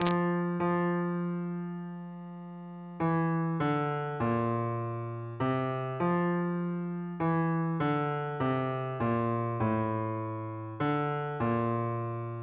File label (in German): bass; Blaeser; Advent